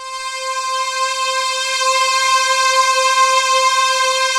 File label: GLASS VOICE, ROLAND D-50; Rubber Chicken Software Sample Bank